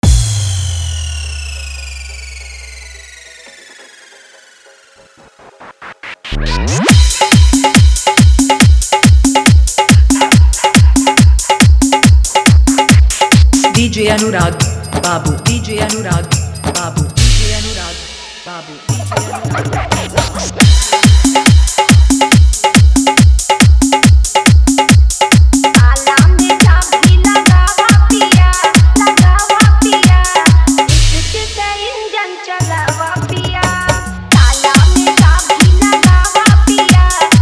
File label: Old Bhojpuri Hit Dhollki Bass Mix